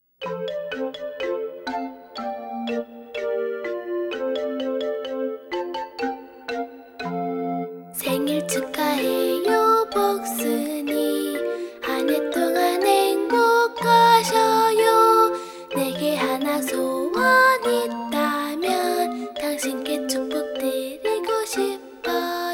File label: happy happy birthday words and music, korean; childrens songbook